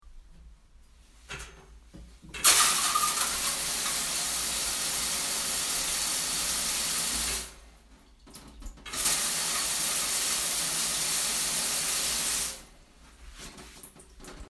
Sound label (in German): Geräusch